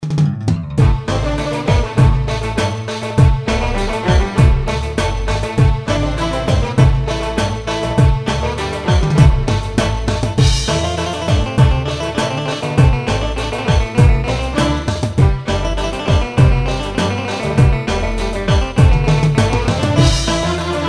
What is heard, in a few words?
Arabesk